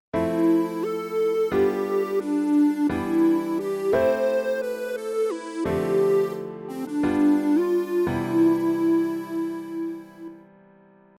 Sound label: chord tetrad